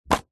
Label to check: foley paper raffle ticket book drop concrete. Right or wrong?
Right